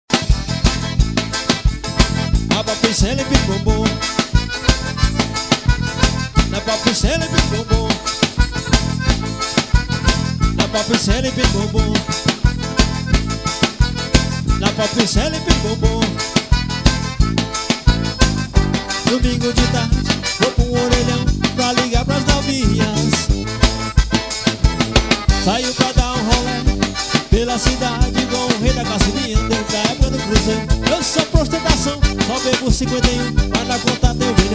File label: pop